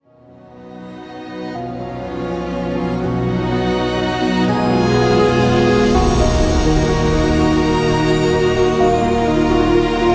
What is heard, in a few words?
Emotional Climactic Turning Point; Scoring Reel